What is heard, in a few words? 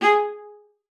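G#4, viola spiccato; Xsample VOL-5; 90 s Sample CDs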